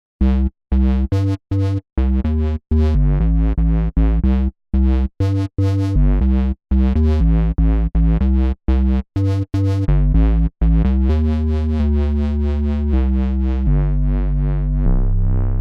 RK100S Bass

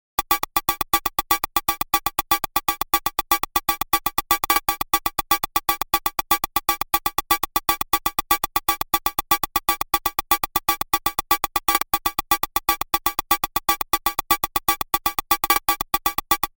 Sixosix Hat Decay Mod; Kobol

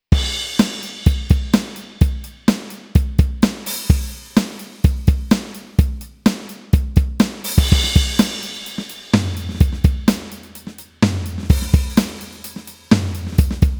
Double Jammer Rock div. Grooves; Harley Benton Dual Effect

